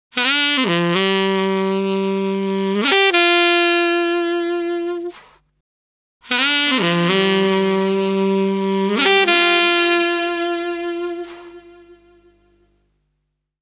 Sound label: breaks, Jungle; Zero-G Jungle Frenzy 2